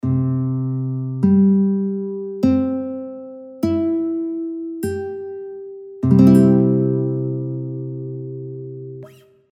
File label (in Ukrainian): Bandurka C-strij Akord CGCEG